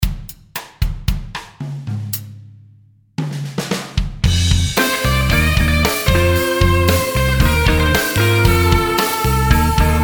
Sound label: slide mix, guitar